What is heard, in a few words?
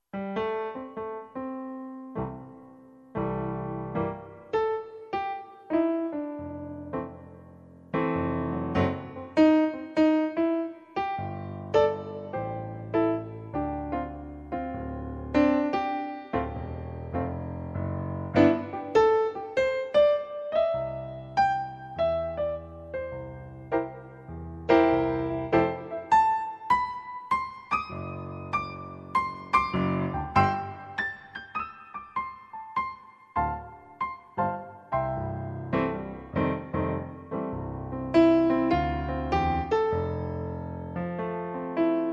Jazz Cafe